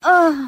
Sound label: "uh" orgasm